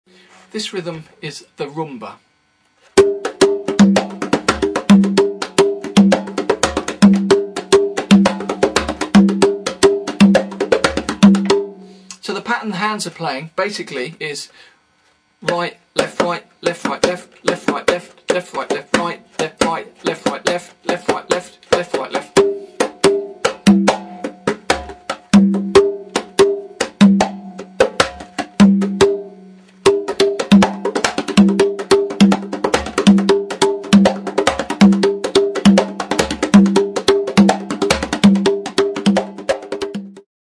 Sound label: conga, rhumba